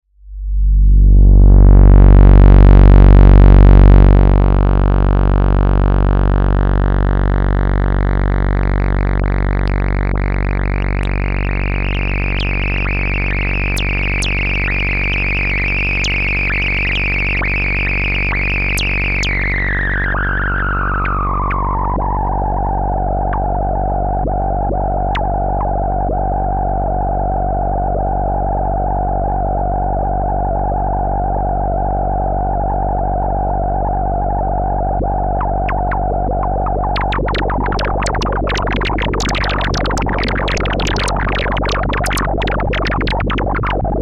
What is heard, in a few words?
Sample Hold Cutoff; Model15